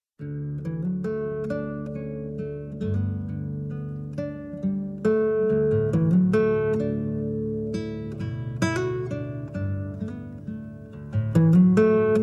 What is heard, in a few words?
Instrumental